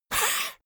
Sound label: monster hiss flat; koerpergeraeusche; comedy-cartoon; soundpool